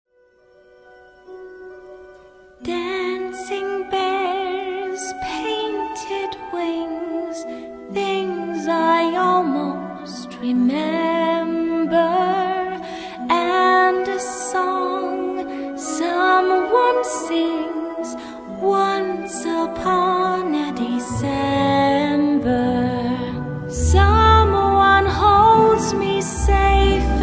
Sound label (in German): Gesang; Anastasia